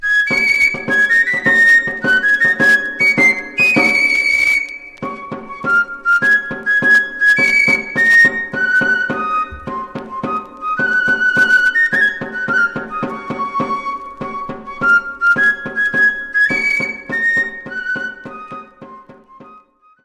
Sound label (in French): Dantza - France - Pays Basque; Zozo Dantza